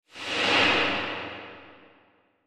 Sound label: Horror Supernatural Ethereal Ghostly Whoosh; Ghosts; Blastwave